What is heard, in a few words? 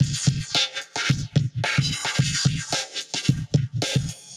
110 Warp Walk, Warped Live Drums; Loops; Touch Loops; CosmicFunk